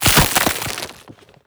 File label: zombie move loud, foley; creatures; Half Life Model Pack